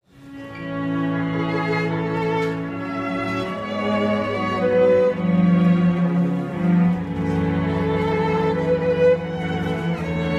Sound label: romantic violin